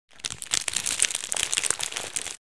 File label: Plastic packet crackling and rustling